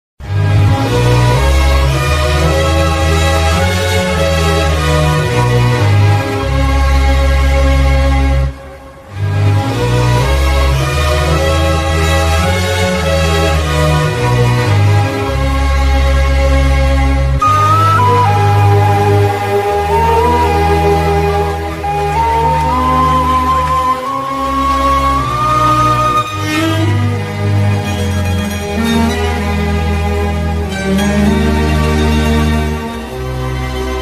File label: climax bgm; ghajini; surya; asin